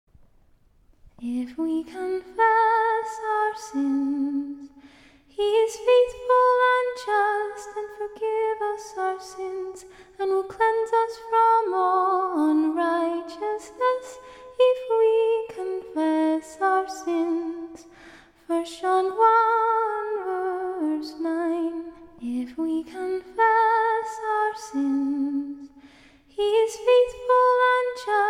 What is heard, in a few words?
acapella scripture